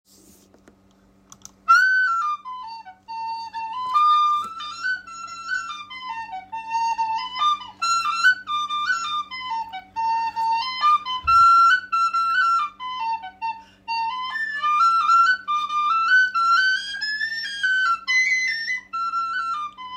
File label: Port Lairge polka, Irish; Finnegans Players List of Tunes